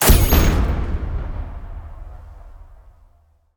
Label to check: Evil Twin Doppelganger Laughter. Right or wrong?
Wrong